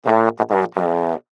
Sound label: Baritone Notes, Baritone Notes Change Descend; Cartoon; Musical; Blastwave